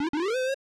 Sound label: Tile Match Jingle; Shanghai 2 Dragon's Eye; snes